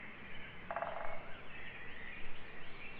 Foret pic vert moyen, Oiseaux

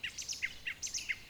x2 seri, Oiseaux

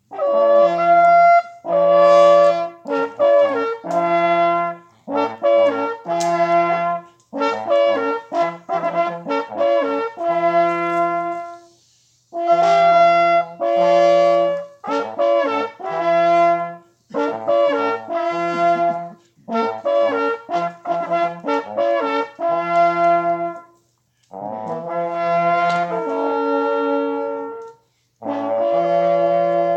Hommage De Chasse Sonnerie; Societe de Chasse de l.Estenbach

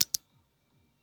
Briquet cliquet; Bruitages

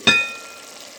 Cloche couvercle casserole

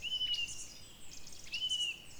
2x sifflement descndant, Oiseaux

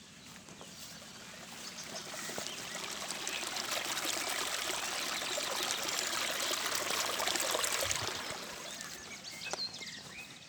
Coulis de l'eau court clair; Bruitages; Eaux

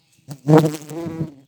Bourdon jaune vol; Vols Bourdn abeilles

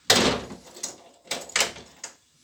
Porte qui claque tour de clé; Percu Bois

